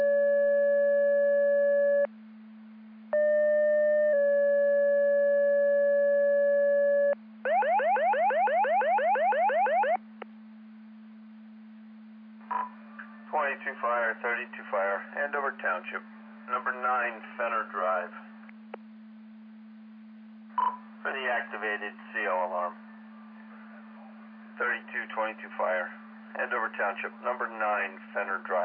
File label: Siren; Andover Twp Boro